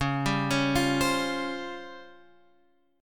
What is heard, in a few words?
Db Major 7th Flat 5th, Guitar, Open F; DbM7b5; arpeggiated; x,8,7,8,8,7